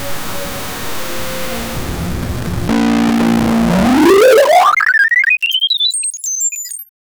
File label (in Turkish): retro televizyon bozulma, television